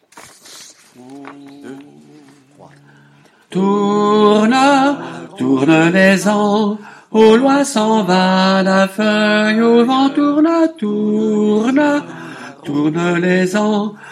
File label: tenors, chorale